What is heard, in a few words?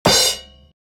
cymbal